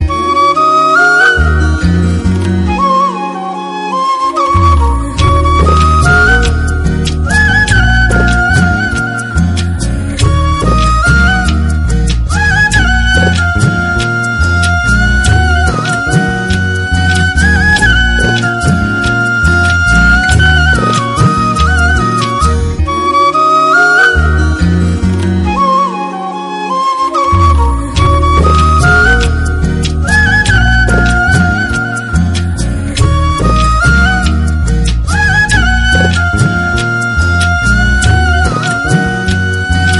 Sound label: romantic flute